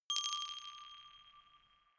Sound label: notification glockenspiel rattle single low